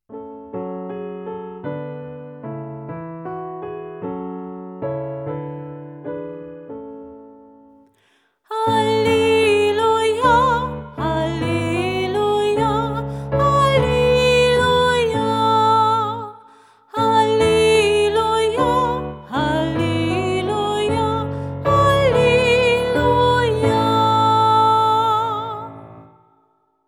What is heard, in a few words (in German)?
Halleluja; Gottesdienst zuhause; Christi Himmelfahrt